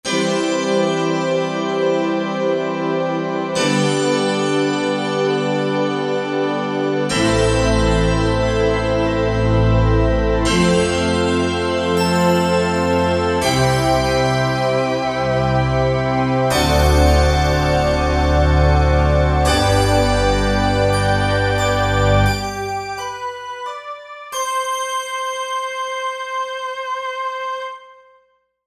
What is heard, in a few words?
Magic Synth